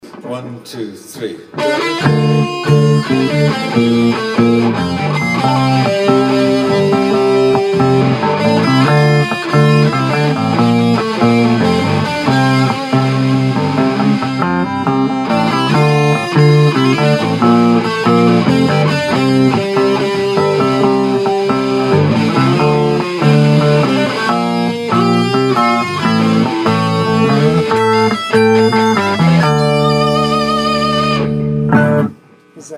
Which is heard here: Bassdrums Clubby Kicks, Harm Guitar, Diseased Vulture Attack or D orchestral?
Harm Guitar